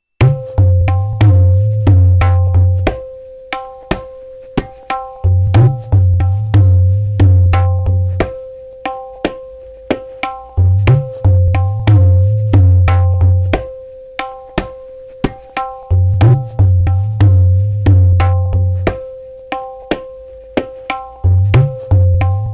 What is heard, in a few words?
kaharwa madhya 90bpm